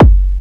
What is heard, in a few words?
kick techno, drums; Techno Trance Essentials